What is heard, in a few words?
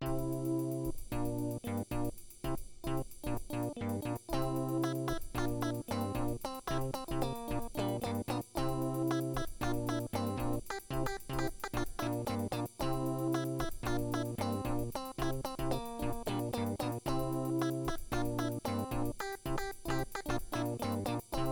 tune; commander genius